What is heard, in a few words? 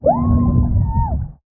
underwater scream female; soundscrate